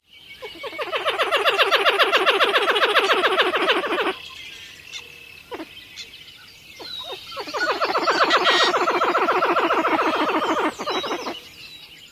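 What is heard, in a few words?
chatter duets, pied-billed grebe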